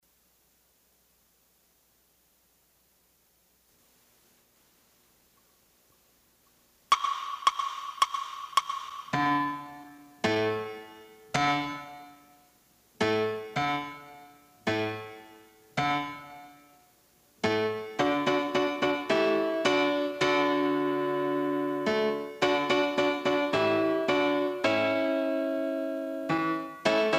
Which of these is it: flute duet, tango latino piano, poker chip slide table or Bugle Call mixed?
tango latino piano